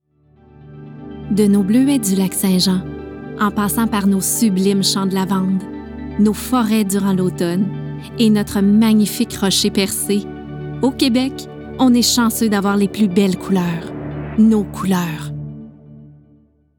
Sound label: Demo vocal; Sico